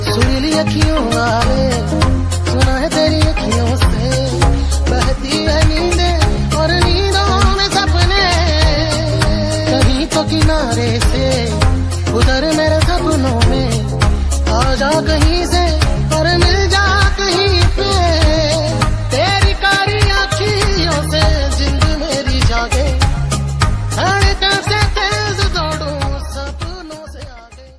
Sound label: hindi love ringtone; bollywood ringtones